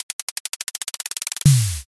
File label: drumroll